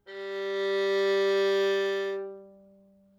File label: violin g 4 non vibrato